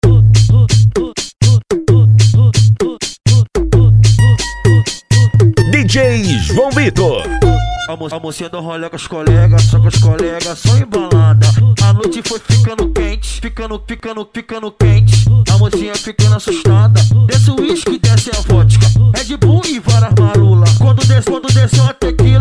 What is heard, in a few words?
funk bass; oz loudspeakers